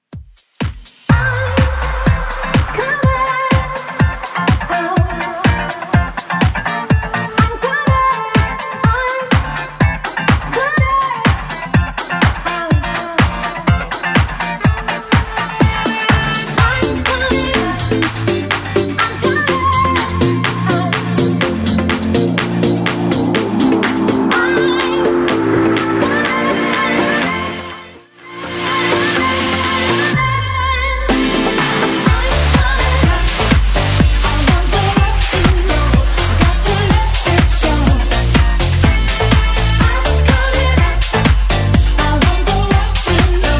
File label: 80s Redrum 124bpm